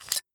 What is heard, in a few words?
Combat Knife Unsheath